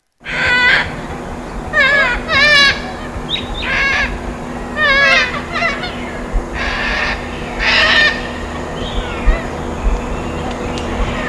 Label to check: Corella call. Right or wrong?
Right